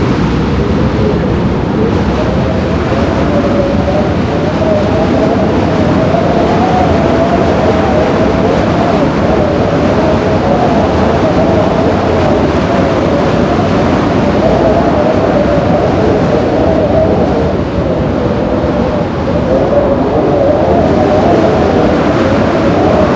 storm outdoor

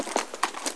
inspect weapon

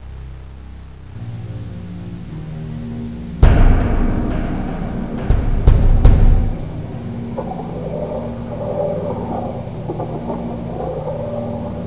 Song

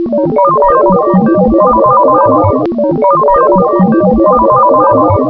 terminal

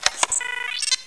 keycard

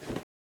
fists miss, weapons

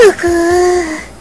"uguu"

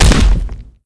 cbar hit generic

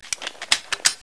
reload ingram

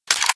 m203 shell

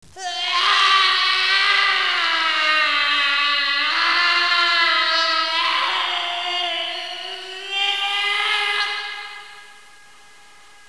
male screams

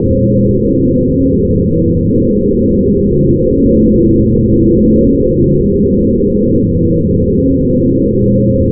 thunder loop

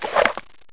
draw snip, weapons

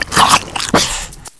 poison bite, headcrab poison; hl2

